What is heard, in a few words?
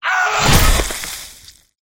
player rough; csgo; eternity; scp; 914